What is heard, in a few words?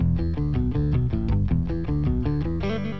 bass